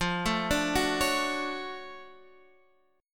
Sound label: D-Major 7th F; DM7/F Chord {X 8 7 7 7 9} arpeggiated